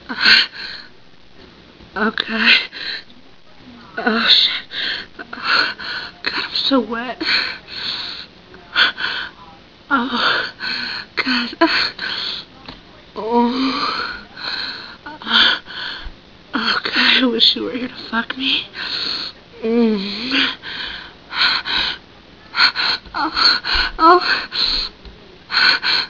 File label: wet orgasm